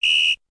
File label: Referees whistle foul